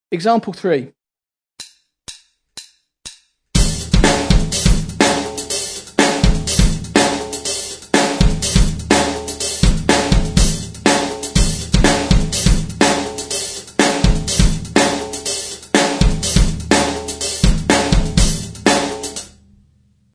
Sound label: drum set